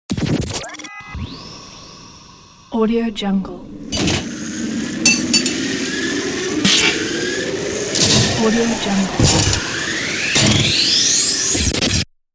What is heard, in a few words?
radio jingle ident